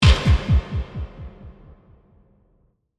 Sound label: Hit Dark Bass, Bump, Delay; BlastWave